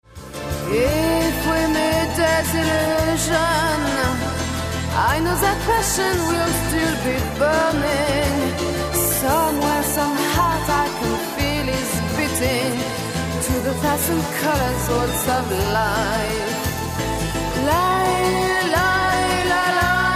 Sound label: Waltz